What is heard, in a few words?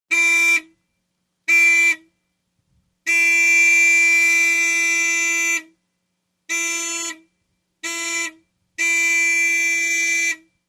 1970 Int Volks Horn